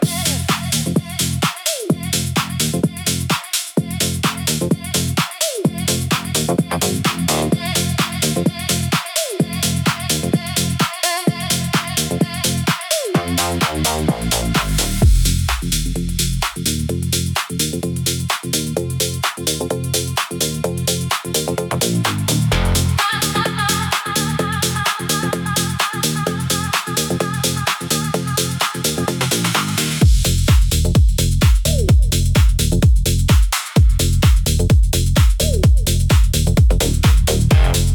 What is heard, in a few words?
Peak Time Rush 128BPM